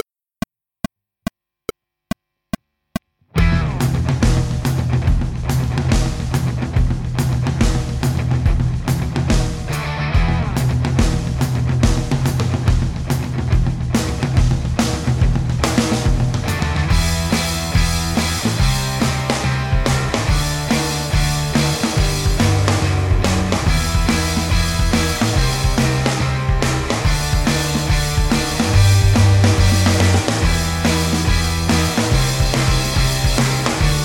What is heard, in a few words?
With drums